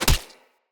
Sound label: Unarmed Punch Impact Default; Weapon Impacts; Player; Combat